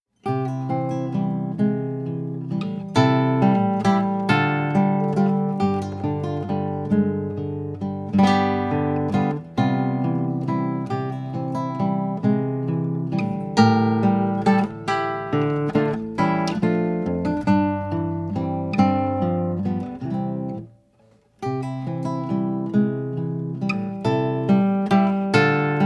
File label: Waltz